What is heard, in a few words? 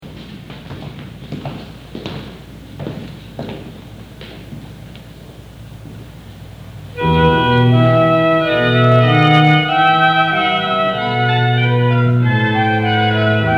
wedding march for flute oboe organ